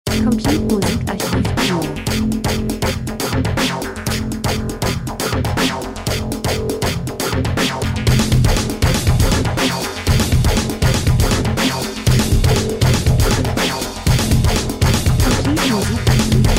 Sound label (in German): drums, rhythmus; Hektik Spannung Eile Urban unangenehm Sequenz gleichförmig monoton Verfolgung TV Krimi Spielfilm Dokumentation